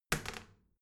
toilet seat lid wall open soft; haus-garten; badezimmer

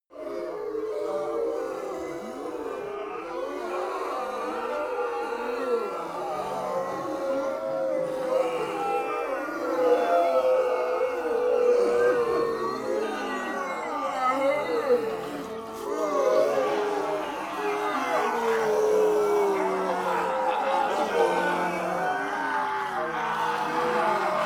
monster walla moan

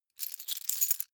key ring large rattle; objekte; schluessel

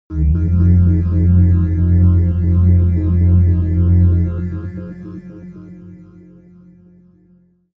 sci-fi mysterious planet, horror

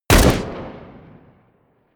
rifle close shot

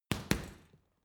boxing punching bag hit double rattle; sport-freizeit; boxen

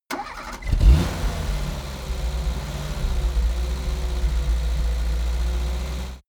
car dacia engine start idle

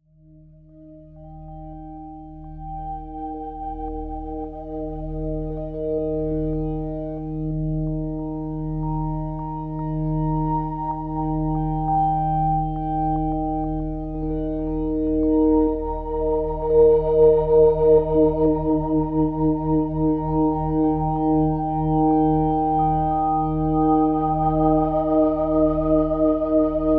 drone organ droner

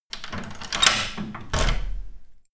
locking door

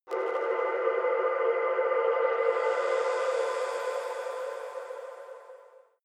shot shining mysterious lights, swooshes swishes

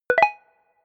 notification bleep

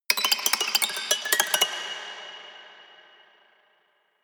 haunted strings weird stroke tinkle hard

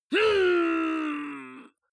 male fight pain groan long; vocals; comedy-cartoon; soundpool

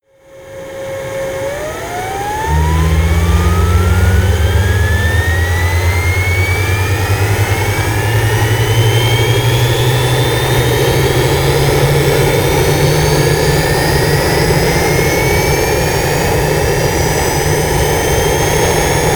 plane cessna private turbine rev up

